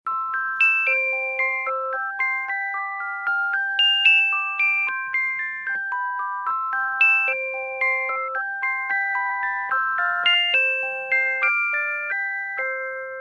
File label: Toy Piano Play